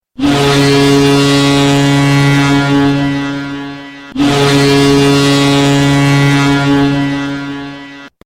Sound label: Horn; Patriots